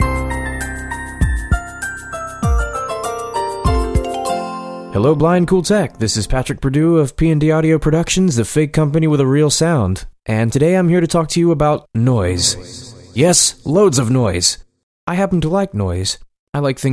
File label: North Carolina Thunder